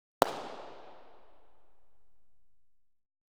haendeklatschen; geraeusche digital

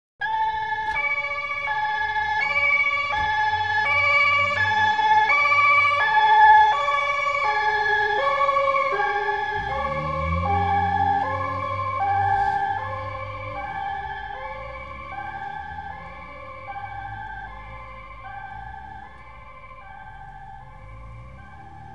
sich entferndendes martinshorn; geraeusche digital

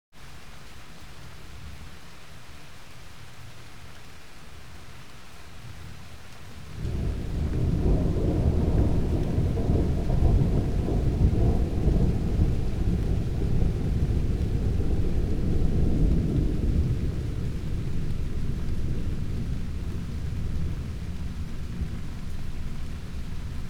rollender donner; geraeusche digital

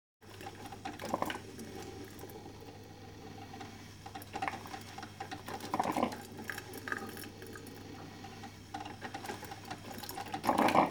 kafeemaschine; geraeusche digital

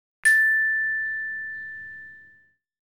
glockenspiel (c-dur-tonleiter) pro ton ca. 3 sec.; geraeusche digital

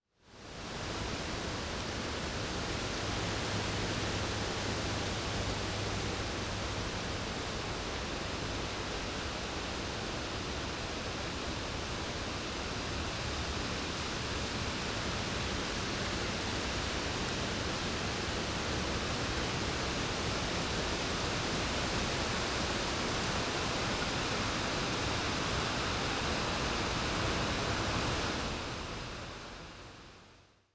starker wind im wald; geraeusche digital

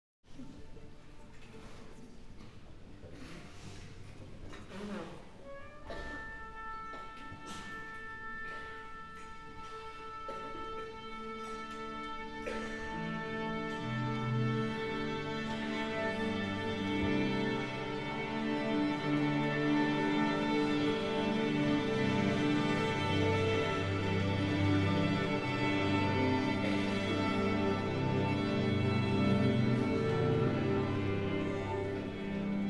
einstimmendes orchester; geraeusche digital

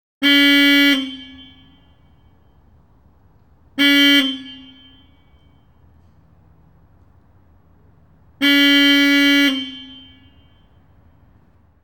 hupe; geraeusche digital

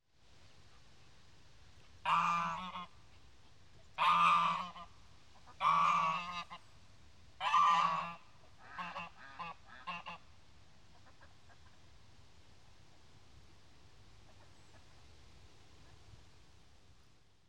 teich mit enten; geraeusche digital

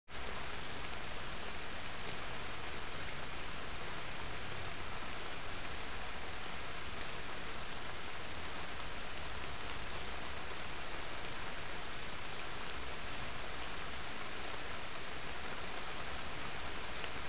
regen mit abschliesendem donner; geraeusche digital

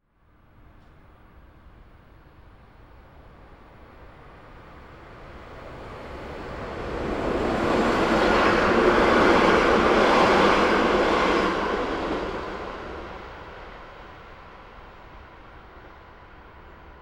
durchfahrender zug; geraeusche digital

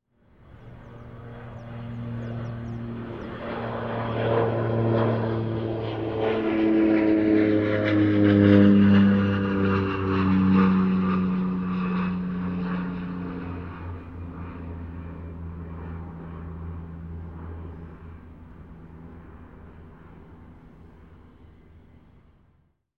startende einmotorige propellermaschine; geraeusche digital

